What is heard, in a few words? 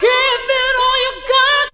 VOC